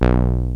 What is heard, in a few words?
ANL BASS, ENSONIQ VFX; Rubber Chicken Software Sample Bank